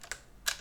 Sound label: Handling gun